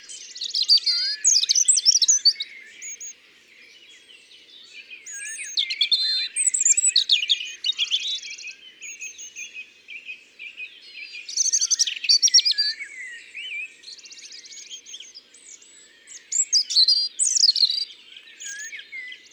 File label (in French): Rougegorge familier ABC des chants d'oiseaux